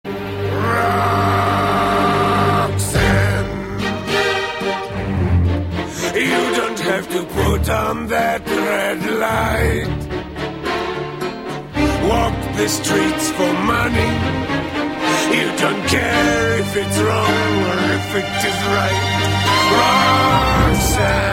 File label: tango; moulin rouge